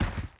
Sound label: step; DAoC